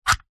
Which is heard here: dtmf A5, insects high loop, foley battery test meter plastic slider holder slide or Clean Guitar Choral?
foley battery test meter plastic slider holder slide